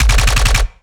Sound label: GUN Auto Burst; RPU Mk2 Burst; Shapeforms Audio; Sci Fi Weapons Cyberpunk Arsenal; FruitMaster